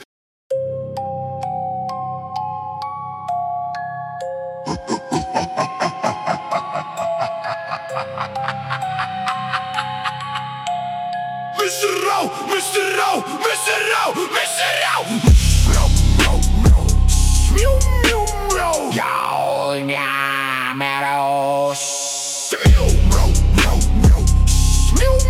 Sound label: horrorcore hip hop